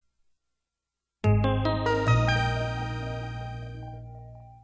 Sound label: Casio Loopy